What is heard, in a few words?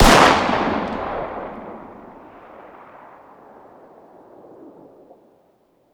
fire dist 9x19 pistol ext